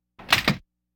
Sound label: Laugh tracks